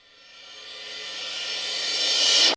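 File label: XE8 back cymbal; Crypton - DrumBase5000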